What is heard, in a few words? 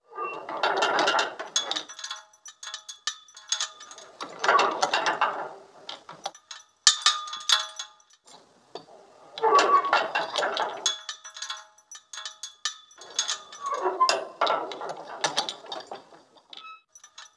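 chain rattle ship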